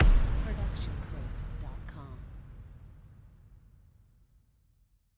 Epic Hit; soundscrate

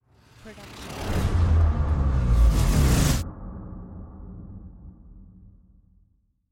Bass Drop; SoundsCrate

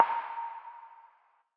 tonal blip; soundscrate

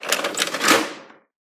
printer opening closing; soundscrate

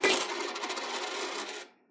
coins drop table; soundscrate

